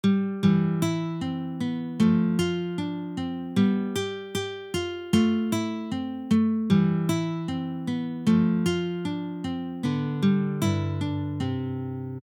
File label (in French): Andante, guitare; Dionisio Aguado; morceaux classique